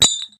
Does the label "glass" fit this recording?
Yes